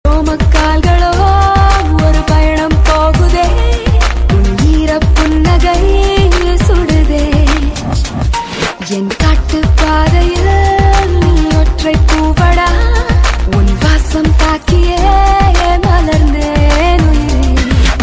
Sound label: Female